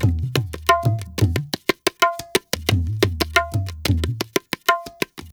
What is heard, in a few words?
ETHNO EXTRA 90; ethno2